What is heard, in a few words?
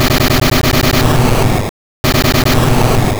Explosion